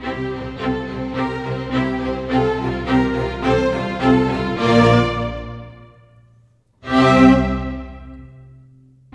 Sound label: Classical Strings, Loop Samples, Orchestral; Sonic Foundry (Sony Creative Software) - Crimson Blue and Fabulous Horncraft 4 RnB